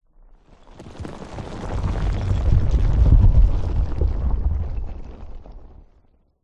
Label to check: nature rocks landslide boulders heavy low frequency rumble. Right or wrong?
Right